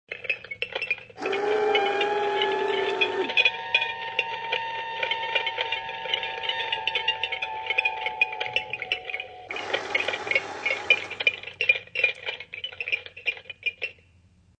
Evil Toy, The sounds of bones clanking and screams.; Children; Human